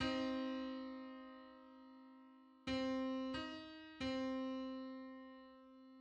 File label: Six-hundred-fifteenth harmonic on C